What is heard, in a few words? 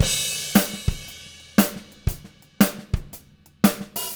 Living Drums; Sampleheads; Peter Erskine